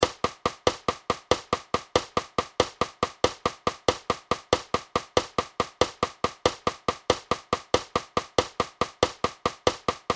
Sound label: melodic buzz beat